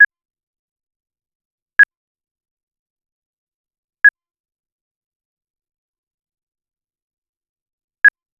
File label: a short soundeffect, transaction